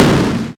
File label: explosion small; cdogs